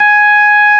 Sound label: hammond; KistB3; Timidity